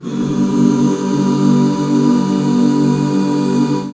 HAL G, BACK CHORDS, Roland; Sound & Vision - Gigapack II CD 1

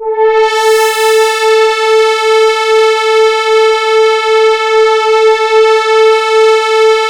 MONO PAD; PADS

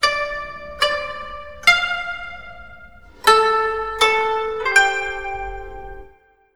KOTO LOOP

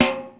SNR; Hollywood Edge; The Big Bad Beatmachine; XR-10

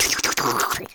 Mouth Fx, Vocal

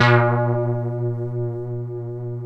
3100 A#2, Korg PS3100; Back In Time Records; Korg Universe VOL-1

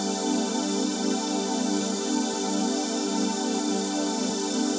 Pad Loop G, shimmer and sparkle; Pad Loops

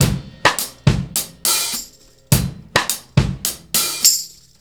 Straight Kit, World Pop Beats

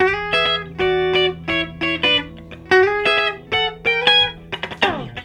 SOLO JAM, Funk Gtr & Wah; PlatinumPhattCD2; E-MU Producer Series Vol. 8 – Platinum Phatt

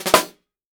snare drum, jungle snare chromatic

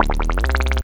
Bubbly, Synth FX; musicradar; cartoon caper

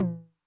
HI TOM, High Tune Med Decay, 909; classic machines

